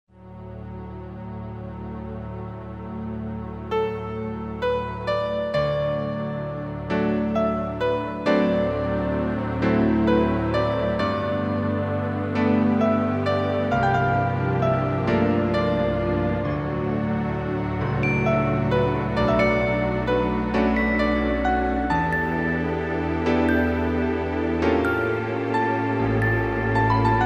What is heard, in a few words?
piano